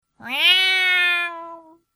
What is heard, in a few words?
neko